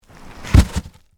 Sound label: Impact Wood Panel, Drop, In, Snow; Materials; BlastWave